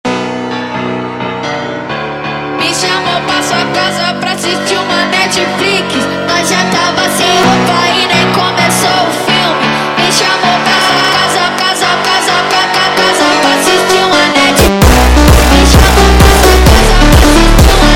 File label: Montagem